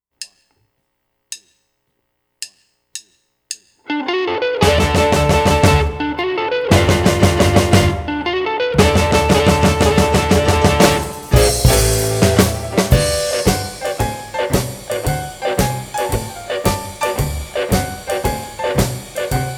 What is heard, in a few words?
West Coast Swing in D, Blues; harp